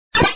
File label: door